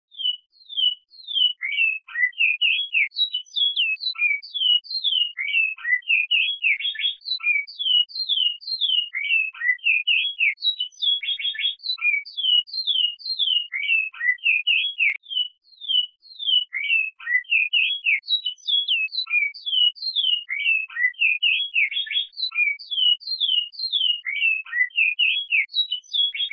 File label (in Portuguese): tesourinha, cantos de passaros; bicudario